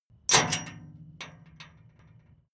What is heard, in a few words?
metal chain impact container